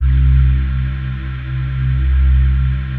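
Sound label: PAD, atmospheric pads; Best Service; XX Large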